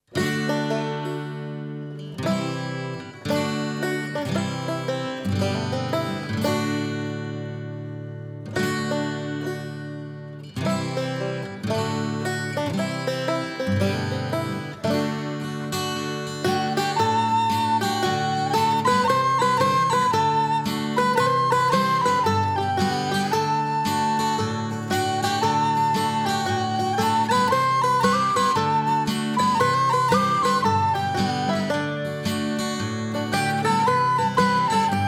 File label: flute version; donegal